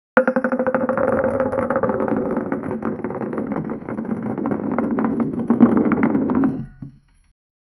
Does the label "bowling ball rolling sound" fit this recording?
Yes